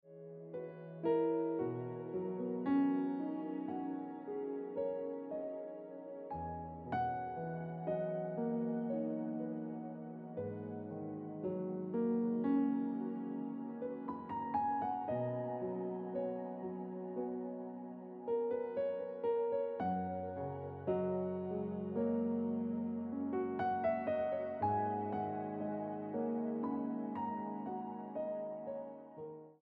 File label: Piano Music